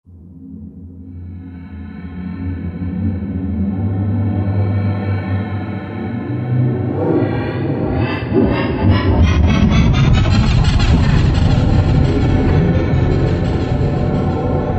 Ghosts, Roland JD-990; Factory Patches

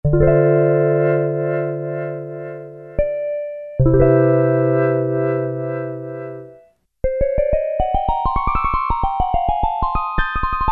Vibes, Korg Poly-800

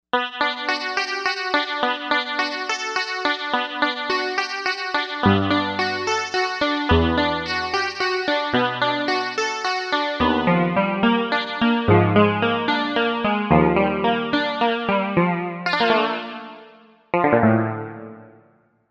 Pulse Pick; Novation UltraNova; Factory Patches

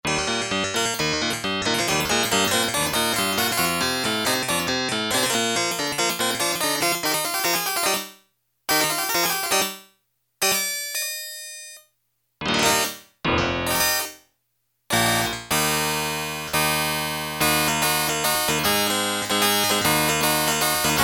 HARPSICH 1, Yamaha DX7

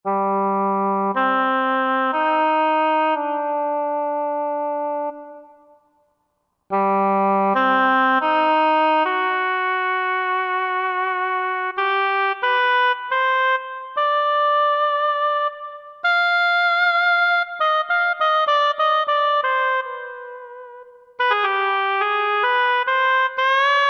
Oboe mf, Roland JV-2080

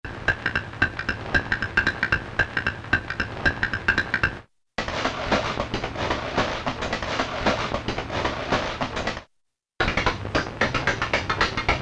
Optigan, Roland L-CDX-02 Keyboard Instruments

